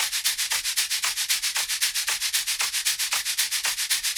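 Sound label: Flag Control, drums, electro pop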